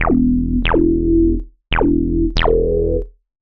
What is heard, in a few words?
ELECTRO BASS 140 A